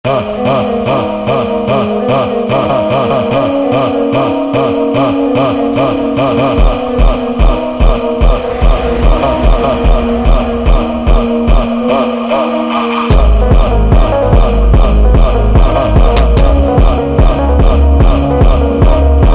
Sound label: Funk sped Up